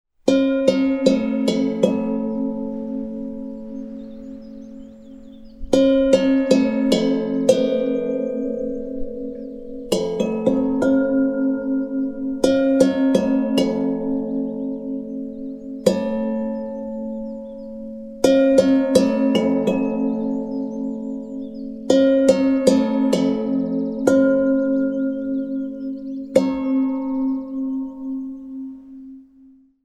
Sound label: bells monk